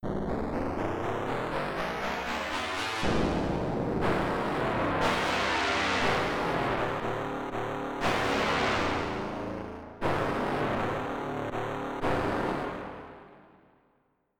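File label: Slow Pad, fuzz guitars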